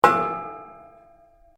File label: METAL ON METAL; Metal (Sound Effects:Materials)